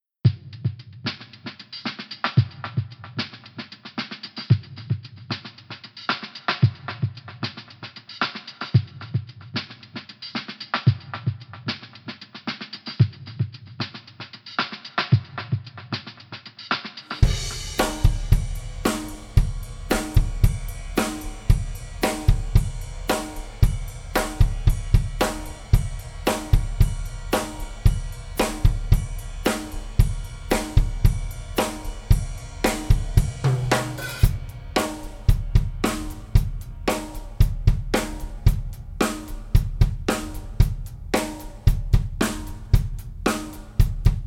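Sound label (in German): Drums smart reverb 100 Prozent Natural weniger Decay Low End Boost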